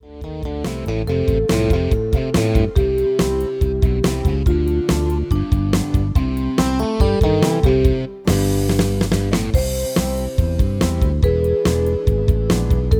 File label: MIDI